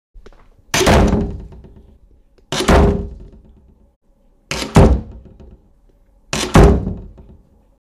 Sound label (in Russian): zvuk hlopka dverju